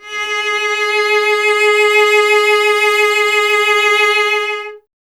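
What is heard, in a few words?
strings cello G#; Miroslav Vitous; Solo Instruments VOL-1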